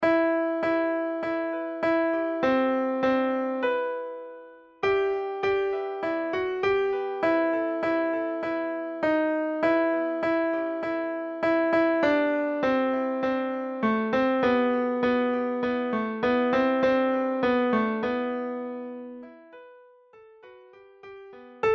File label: Alto, midi; 請眾高歌